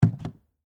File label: Suitcase Leather, Foley, Suitcase, Leather, Set, Down, On, Side, Hard, Shell, Antique; Set Down Sound Effects; BlastWave